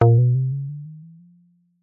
cartoon twang deep heavy elastic; zapsplat

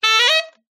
Sax Roll

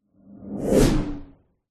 magic spell whoosh powerful energy pass electric; fantasy

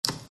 switch off; fox audio

